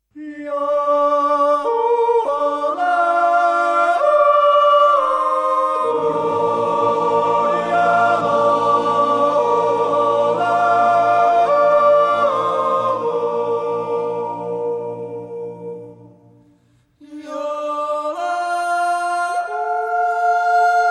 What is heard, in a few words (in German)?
jodlergruppe, musik; Schlieraetal; renggjuiz